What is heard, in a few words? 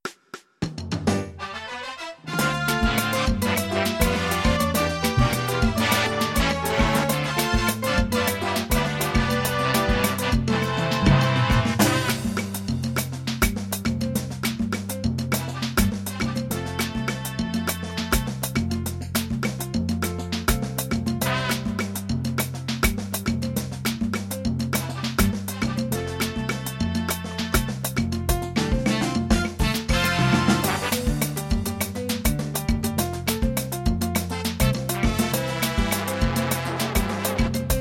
Big Band Salsa, rhythms; mzx500; preview pack 02